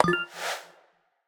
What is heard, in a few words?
pause back click; [EZHD] BlooMoon